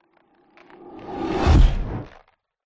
fantasy magic spell zap whoosh cold ice crack impact